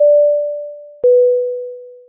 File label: cabin chime